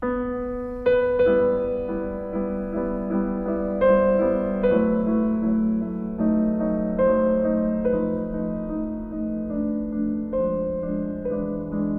prelude pour piano; CHOPIN (Frederic); Daniil Trifonov

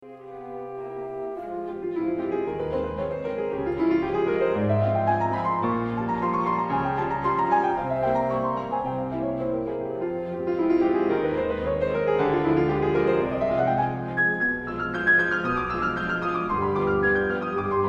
concerto pour piano, allegro moderato; FIELD (John)

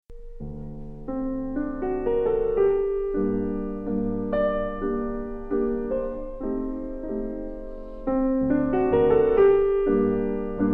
ballade pour piano; CHOPIN

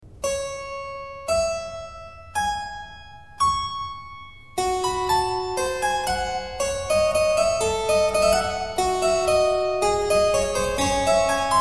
sonate pour piano; SCARLATTI; K213-1

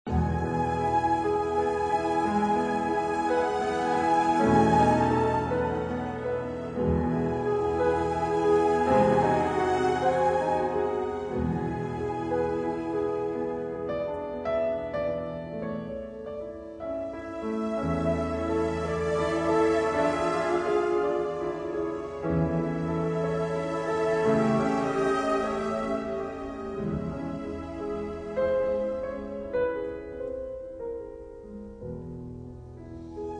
concerto piano, adagio sostenuto; RACHMANINOV (Serge)

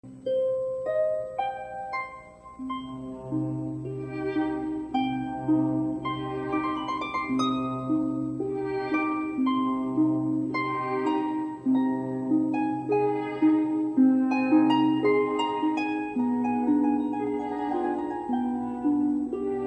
concerto pour harpe, largo; BOELDIEU

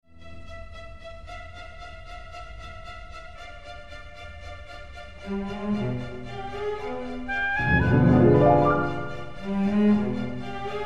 concerto pour piano, Rondo allegro; BEETHOVEN

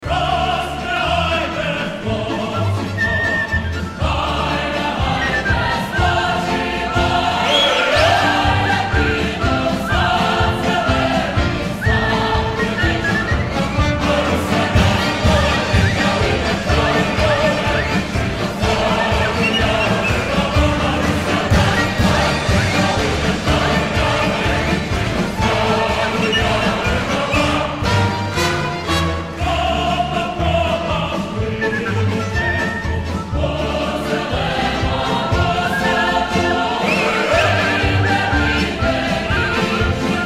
folklore ukrainien; rozpriagante klopi

